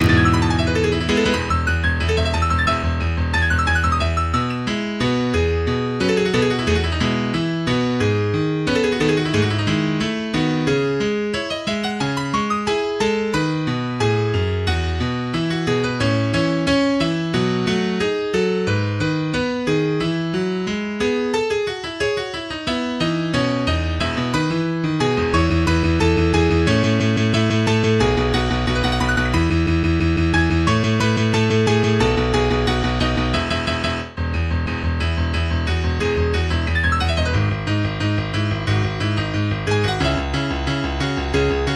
piano

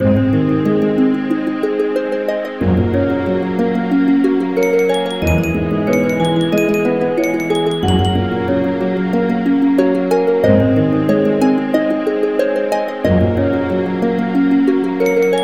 Boss Room Calm

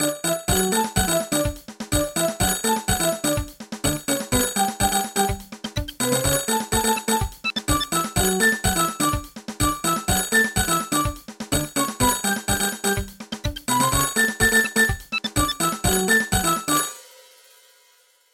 nokia frolic

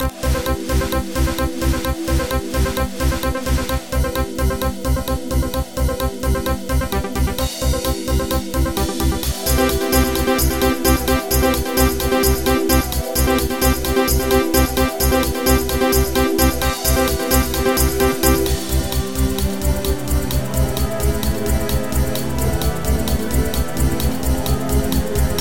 TrancePop

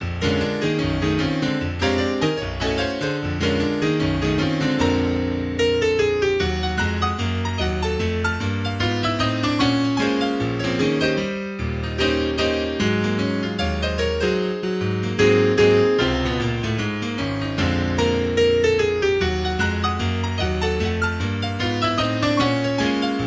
Waltz

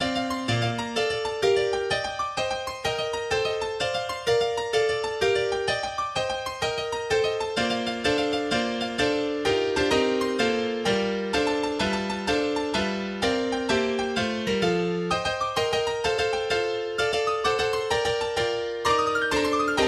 Christmas Bells are Ringing

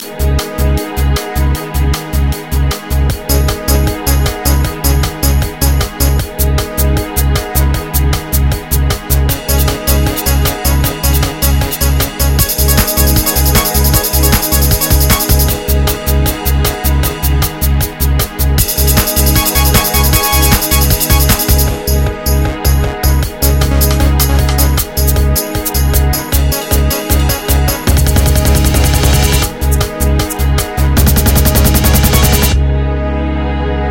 EuroTrance

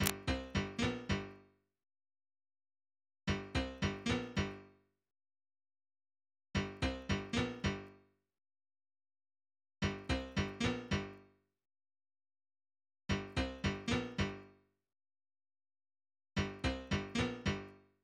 piano riff; piano riff ringtone

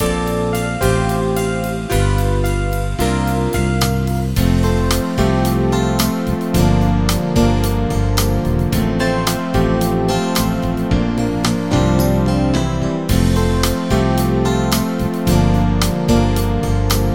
8Beat; PSR-E473; Song recorded on PSR-E473

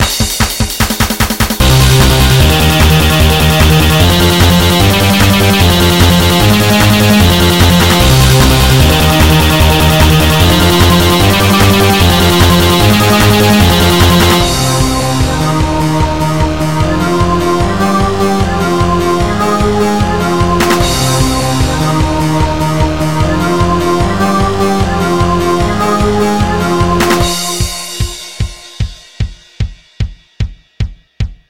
Browbeat Loop

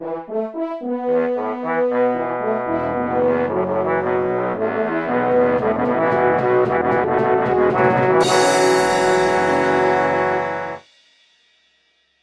Introduction Fanfare

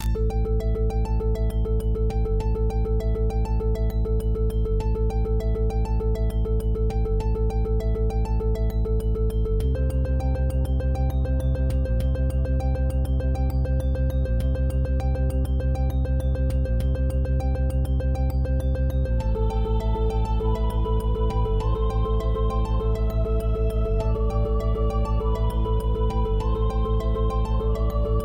Background

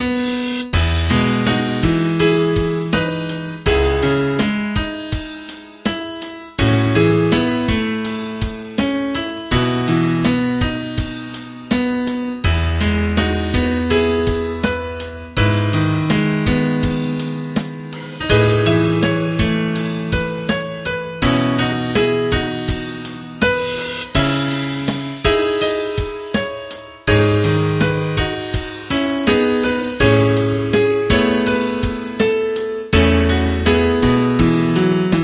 Piano Solo

